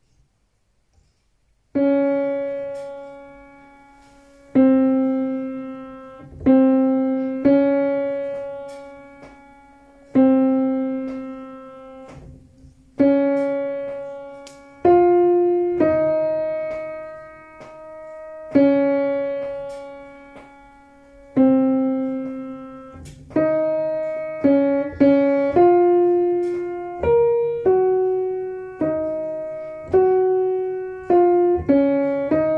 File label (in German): Tenor moll; 1. Tenor